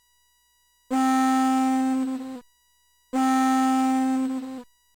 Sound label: woodwind, Karplus Strong